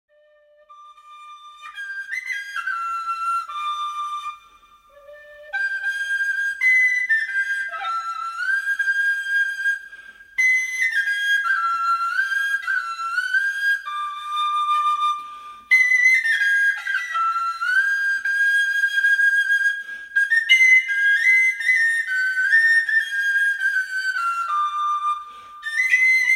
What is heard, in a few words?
Whistle